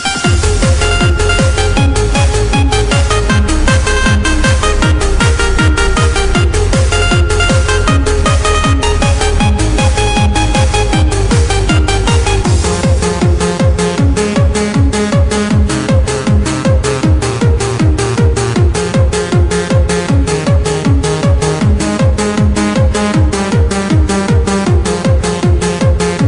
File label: Techno Remix